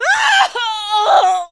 death

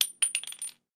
case, explodium gun

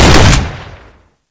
Big M Fire

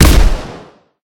hit, explodium gun

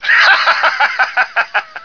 laugh, male

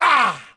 injur

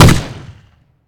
lead ball fire

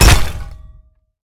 hit; hammer

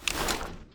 deselect; hellblazer

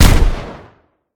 hit, explodium gun

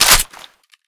open; spreadgun

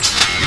Loading

